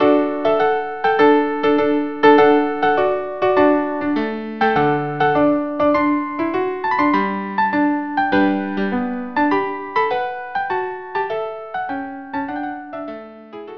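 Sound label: violin viola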